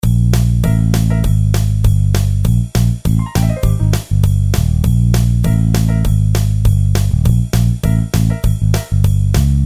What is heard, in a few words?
loop